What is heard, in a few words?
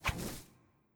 Godess Sceptre